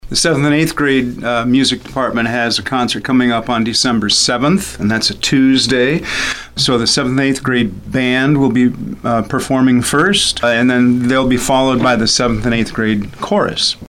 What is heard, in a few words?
concerts; hcsd winter concerts showcase student talent